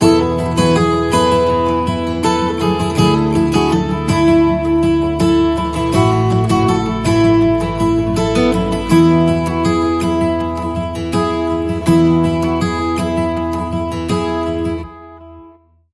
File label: Positive Forward, No Drums